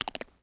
Teeth Clack Soft; Vintage Cartoon; Foley

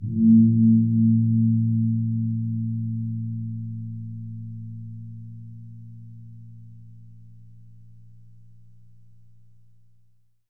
Deep Bass Swell; Hits & Tones

